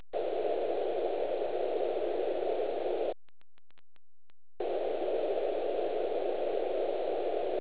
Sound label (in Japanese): band 500, 1/1オクターブバンドノイズ 500Hz -20dB